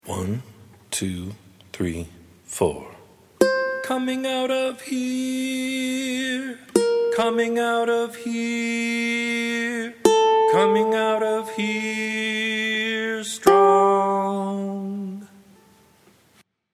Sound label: chorus